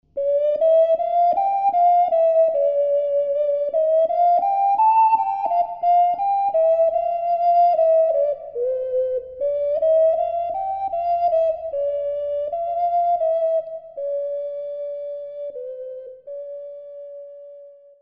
tourdeon, ocarina

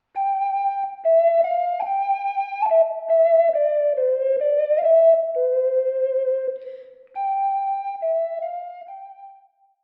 haensel und gretel, ocarina

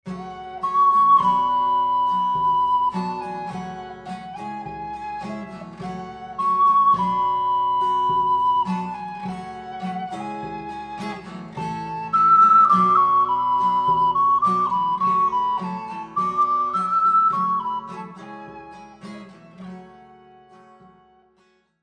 Govinda, ocarina; ocarina-atelier

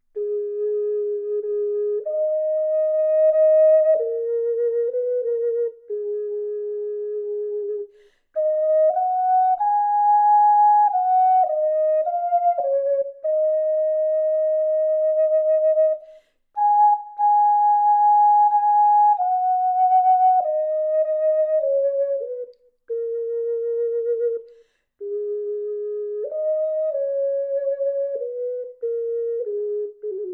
Fis Scarborough fair, ocarina

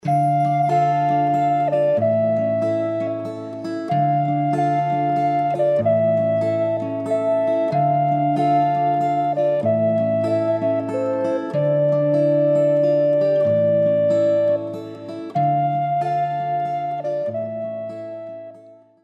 Return, ocarina

